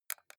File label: console controller xbox joystick press